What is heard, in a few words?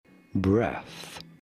Breath